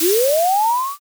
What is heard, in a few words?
firework launch